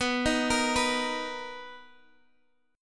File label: B Major 7th B down Guitar Standard; BM7; arpeggiated; capo=2